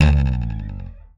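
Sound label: slap, bass; Xsample VOL-1; SLAP+THUMB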